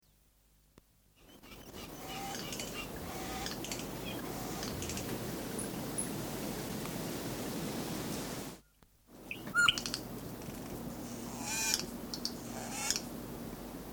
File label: south island saddleback song; conservation, native animals, birds, bird song